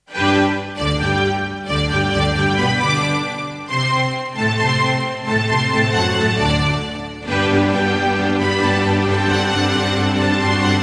Mozart - Eine Kleine Nachtmusik partial, Alesis NanoSynth; classical